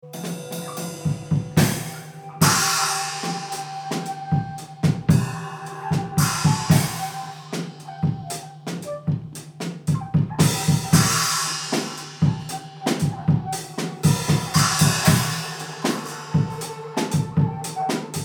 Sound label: Car Crash, Orchestra Rehearsal; Cube Orchestra